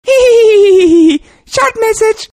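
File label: Short Message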